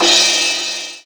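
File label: cymbal crash; Best Service Gigapack 2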